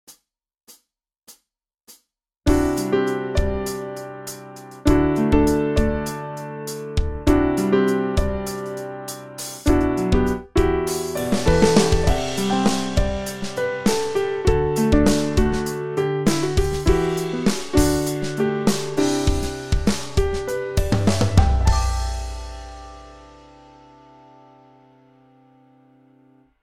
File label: modern upright Wide Stereo; xln audio